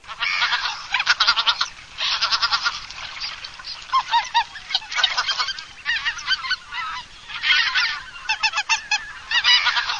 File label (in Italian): oca lombardella, birdsound